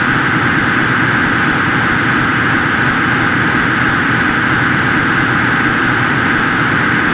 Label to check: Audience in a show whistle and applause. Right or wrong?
Wrong